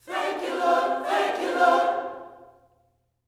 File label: THANK U, Vocal Gospel